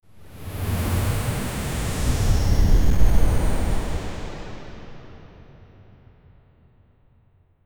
whoosh

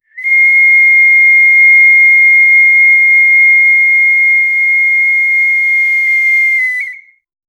realistic foley of a, earthquake

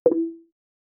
sound of clicking on the, gaming

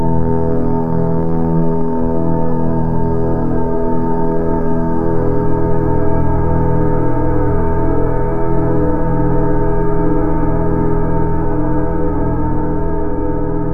590 hz sine; drone

